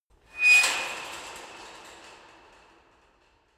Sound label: metal squeak rattle; metall